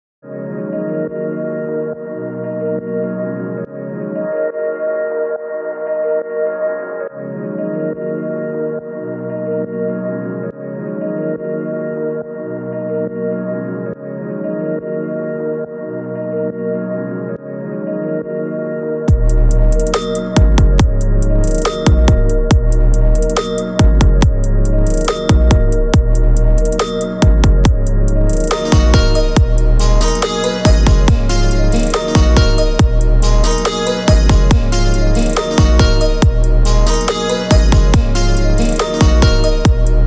beat; Eletrônica; Itaúna

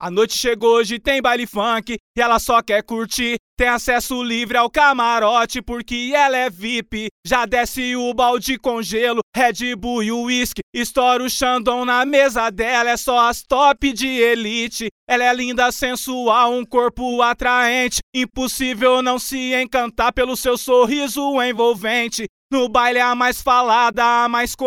acapella

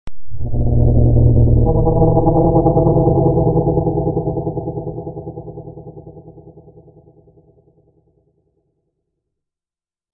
horror scream whisper, scary effects

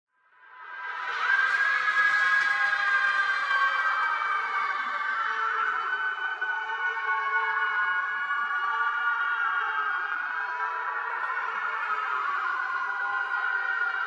scary ghost children screaming

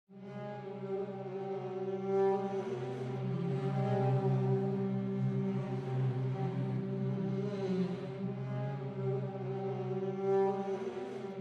scary horror orchestral music

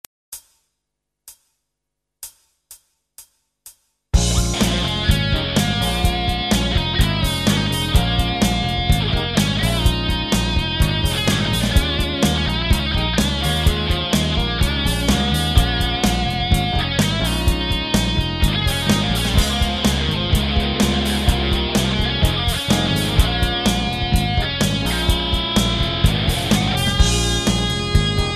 blues

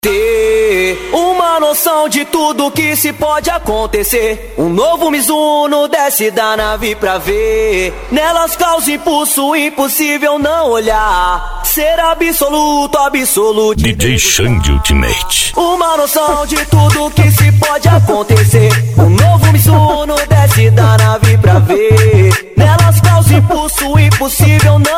funk bass

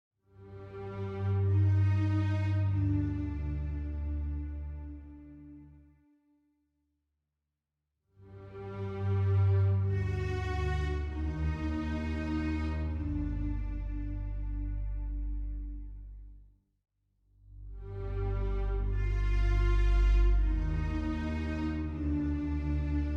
little girl melody creepy horror orchestral music

